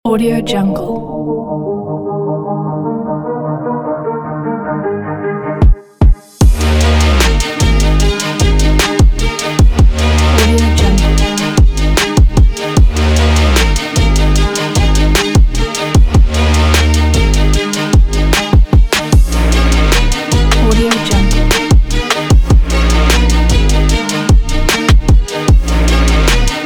hip hop beat epic

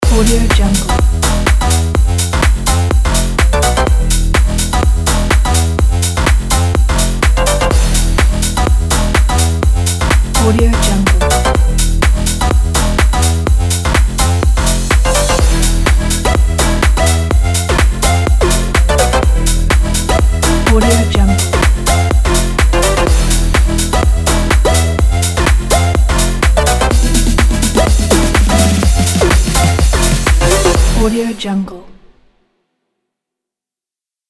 future bass house

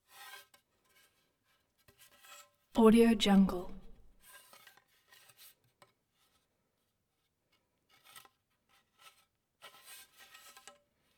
handling metal object; collanderson

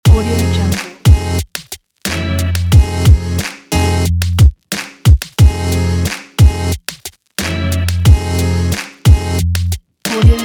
hiphop loop

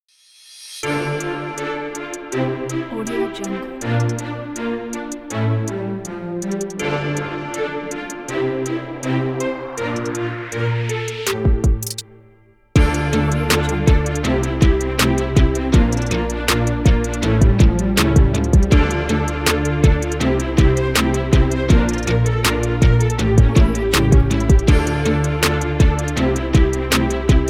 sports orchestra hip hop

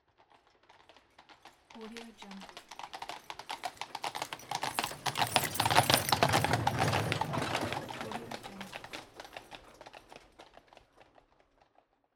horse cab fast passing by

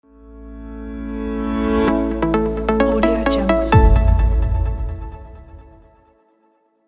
tech digital news logo

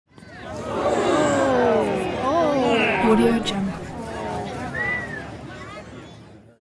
baseball game disappointment reaction

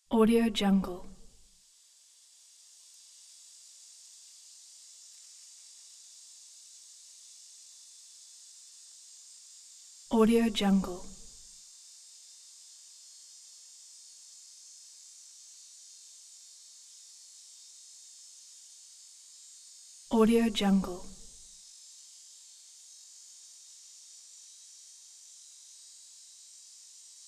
summer 7 year ciccadas stereo; collanderson

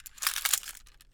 gun mechanism strong; soundmorph

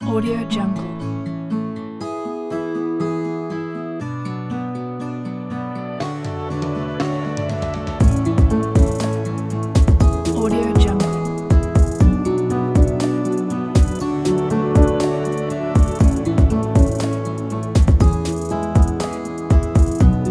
soft motivation kit

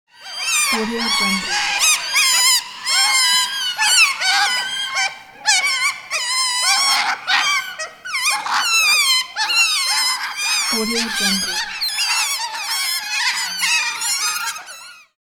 otter cries and squeaks

